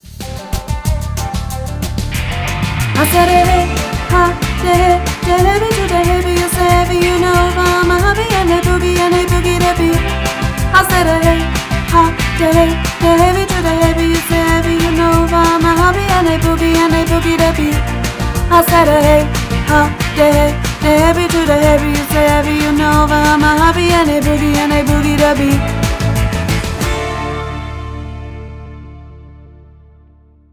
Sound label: example track